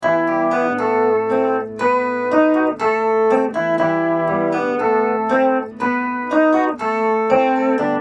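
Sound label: acoustic guitar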